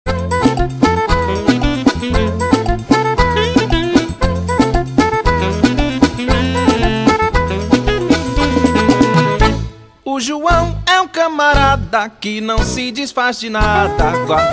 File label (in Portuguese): sax baiao alto e soprano